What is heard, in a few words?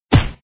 kick; santa